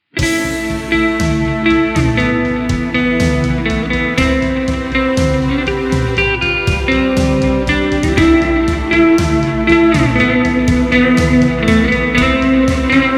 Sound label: acoustic version